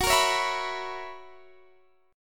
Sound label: F sharp 11th F sharp down Guitar Open D